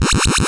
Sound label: level complete; cubicmaze